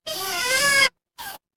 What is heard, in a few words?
cartoon squeak